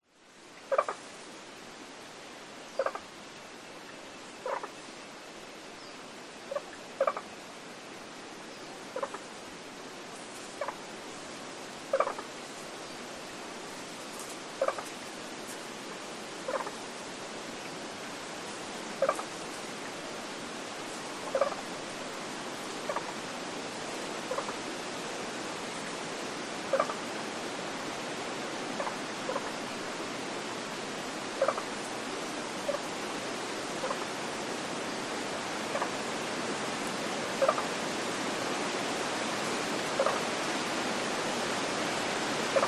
nature rainforest ambience wind tree canopy above birds frogs; scarlet sided pobblebonk call; zapsplat